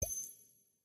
combination sound

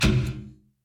old ubuntu error sound

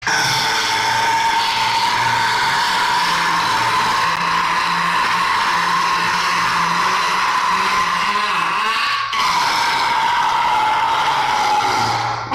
burping; sprite

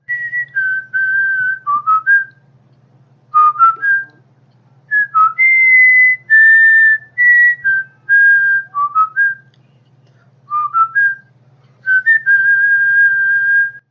deadly whistle

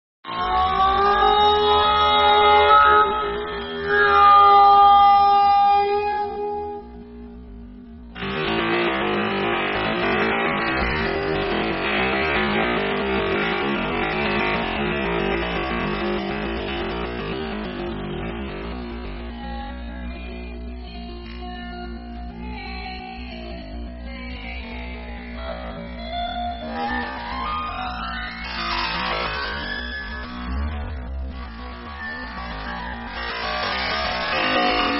oh no fart slowed

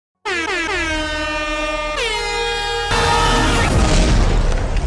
airhorn

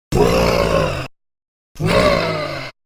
gaper sounds

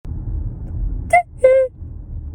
teeheeeeheeehehheh

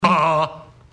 uhhh

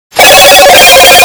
razr ringtone earrape

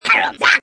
warcry; fallen

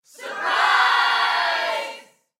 crowd surprise

